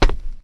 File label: metal solid, footsteps